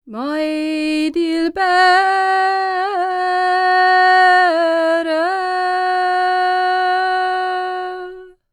SERBIA, Vocal World Voices SERBIAN; ILIO; Vocal Planet VOL-5

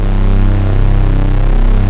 FAT SAW PAD; Pro-Rec - Synthsonic

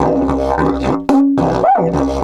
108, DIDGE 108BPM

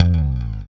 Bass Slide, Roland BK-5; Loops & Phrases

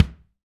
BD, BD REAL

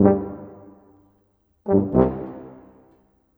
Trombone Tuba; Sonic Foundry (Sony Creative Software); Orchestral Series VOL-4 - Rock and Pop

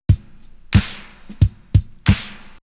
Beat; AMG

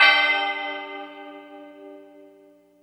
bell fm (G); Sonic Foundry (Sony Creative Software); Ugly Remnants VOL-2

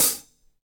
Jazz Closed HiHat

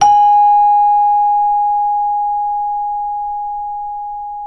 CELESTA A#3; Synclavier Percussion; World & Orchestral; ILIO

